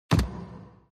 Stop Roller Fast

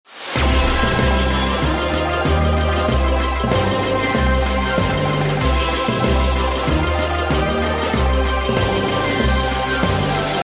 Big Win Bgm

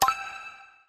Appear Wild Sound

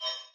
Feature Buy Sound

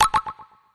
multi total Sound

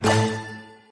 Appear Total Win Sound

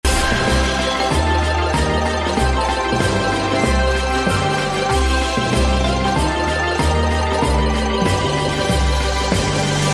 Mega Win Bgm

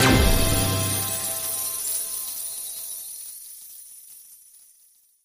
Total Win Last Bgm

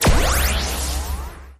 Spin Button Click

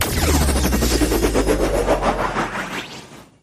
Ready Hand

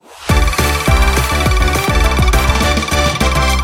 Total Settle Sound

